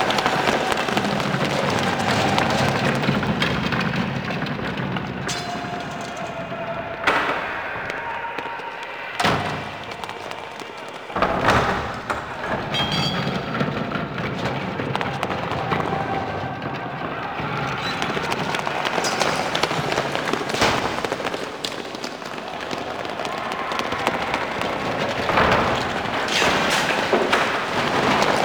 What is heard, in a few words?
Town Commotion Loop; Warframe; Ambience; Duviri